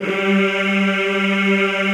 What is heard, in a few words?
G2, Classical Choir